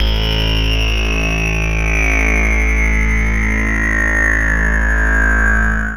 REZ SWP, Trance, SYNTH; AMG; Global Trance Mission VOL-2

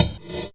percussion, Severe Gate Kit; Dance Synths

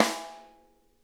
rim mf R, snare; Sonic Reality; Interactive Drum Kits

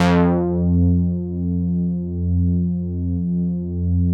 OBERHEIMS

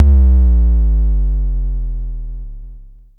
BASS, Dual Percussion Synthesiser; Klone

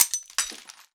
BEER BRK, HANDY PERCUS; AKAI S-Series CD-ROM Sound Library VOL-5

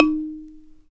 BALA, Balaphone

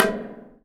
Thunder Drum

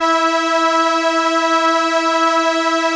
ARP E3, STRENS; Sonic Reality; Vintage Timetraveler

